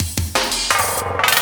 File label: loop, Drum & Bass, 170 BPM